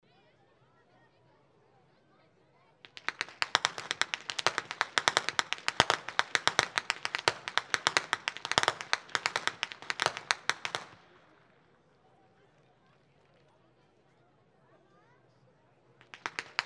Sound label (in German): schnalzen, jugend